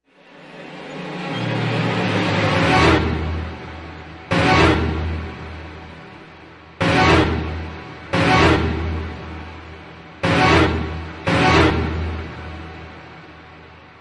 Instrumental Version

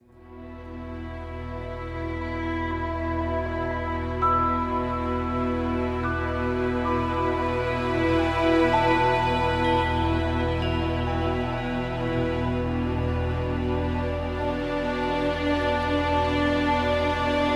Dimensions Ambient

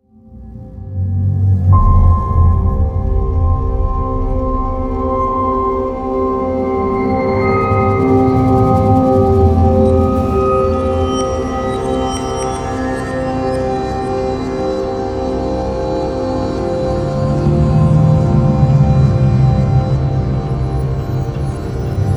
High Winds Approaching, Epic Drones & Tension; Epic Drones & Tension vol. 1

